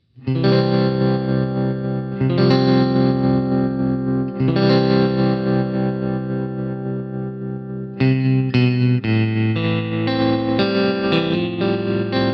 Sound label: Tremolo